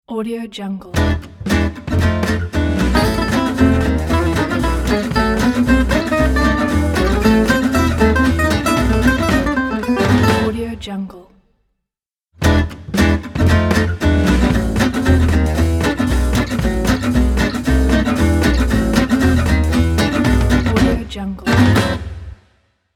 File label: fast gypsy swing logo